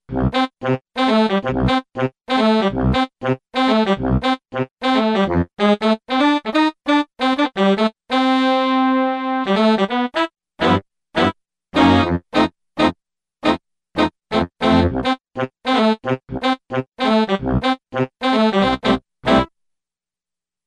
REV 4 PLATE, Yamaha REX50

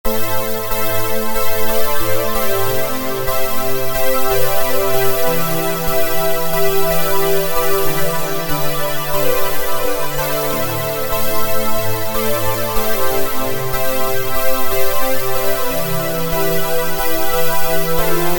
synth; Apple GarageBand 2; SYNTH BASICS; Constellation

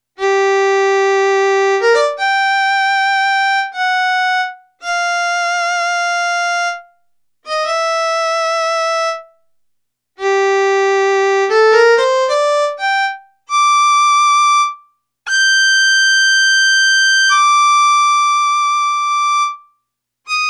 Mini Violin

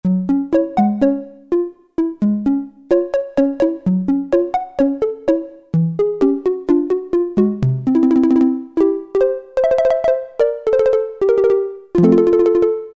Percussion, Cheetah MS6

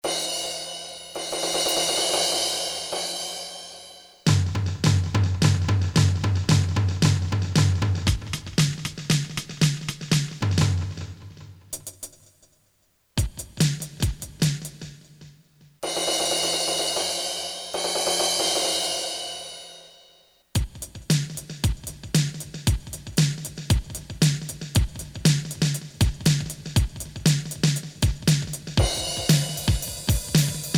SPACE, Drums, Korg DSS-1; Drums IV

